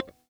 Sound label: c5 release, glowlybass